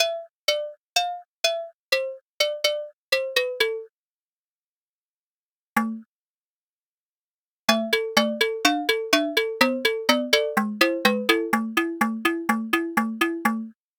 agogo

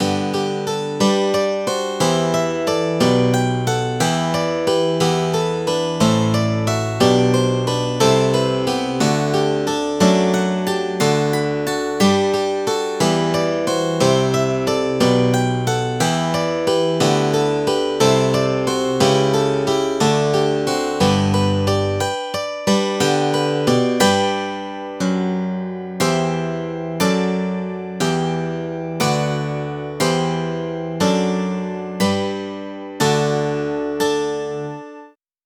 steel string guitar

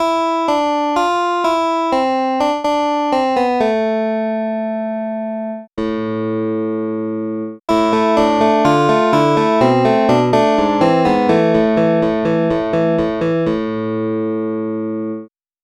picked bass

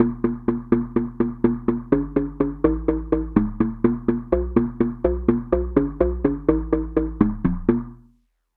xylophone bass

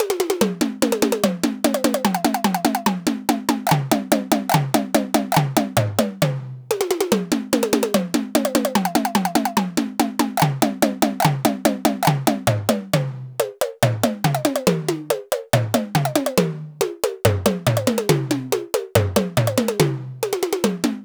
melodic tom

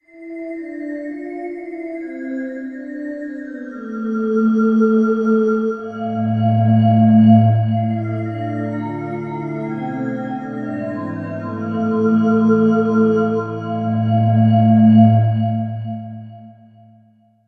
goblin

